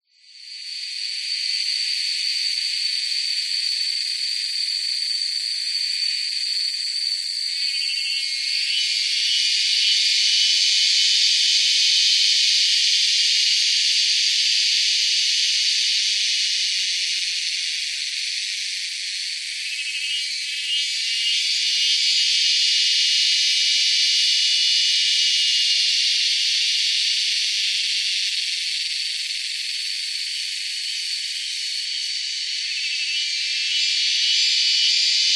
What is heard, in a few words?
animals insects cicada forest thailand